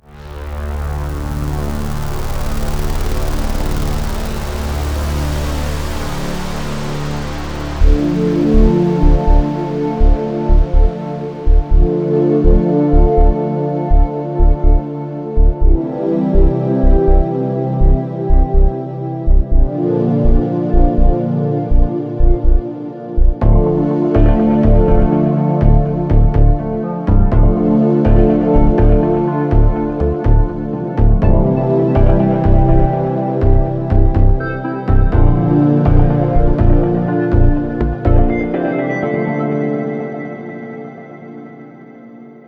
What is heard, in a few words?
synth ambient